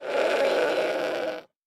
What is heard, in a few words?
Sheep Baa Frail Sick; sound effects bible